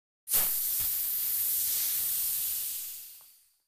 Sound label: Biking Short Hissin